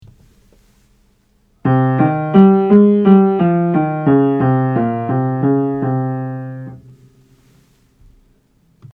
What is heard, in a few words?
Modal Scales Aural Training exercise audio